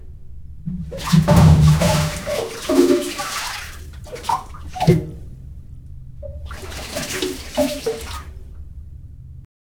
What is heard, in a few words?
sinking ship with creaking hull and rushing water